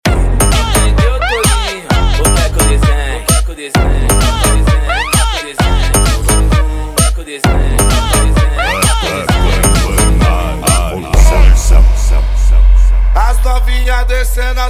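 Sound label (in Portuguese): eletro funk pancadao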